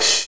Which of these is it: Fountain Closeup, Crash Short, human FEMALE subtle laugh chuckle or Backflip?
Crash Short